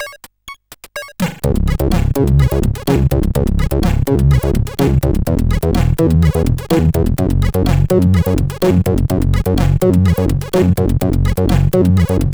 sid CSG8580R5

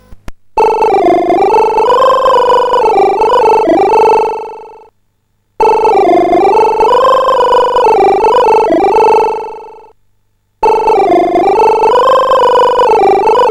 sid CSG8580R5; Omidar

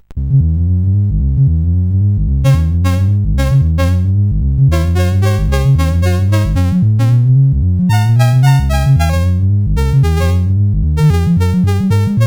Shuffle, CSG8580R5

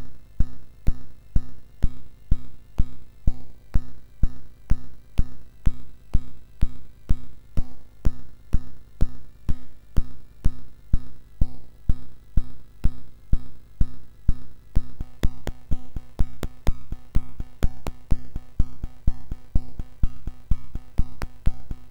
Piano Work sid MOS6581R4

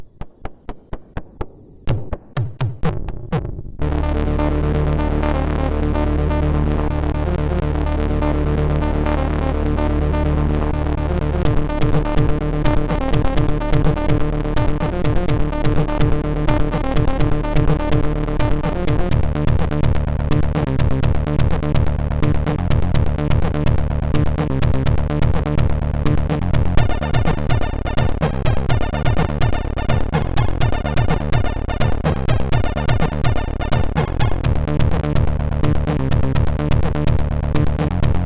sid MOS6581R4; Mega Sharp Recital; Compod

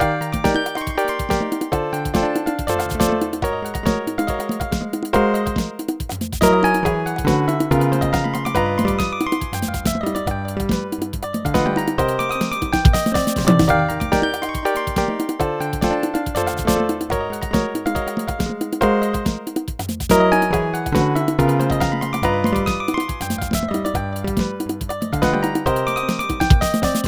solo; Dr. T's MIDI Recording Studio

